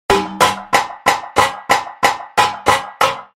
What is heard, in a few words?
bang